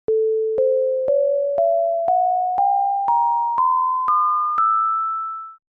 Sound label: scale, Sonic Pi